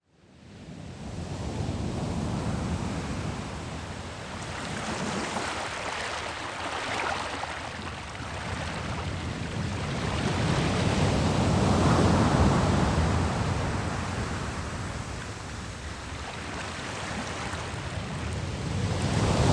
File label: ocean surf; sound oasis